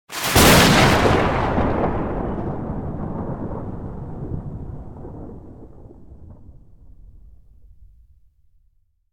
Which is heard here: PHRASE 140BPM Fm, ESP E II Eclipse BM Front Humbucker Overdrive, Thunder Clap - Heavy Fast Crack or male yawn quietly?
Thunder Clap - Heavy Fast Crack